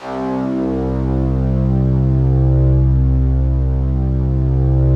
FOGATK1 C1, Yamaha VP-1; Music Store; 90's Sample CDs; FOGATTACK 1

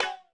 Mega Drums, Korg 01W

